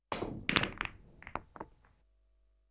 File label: Billiards Various Different Hits; KMP Film Video Sound Effects